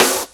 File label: clear snare f sharp key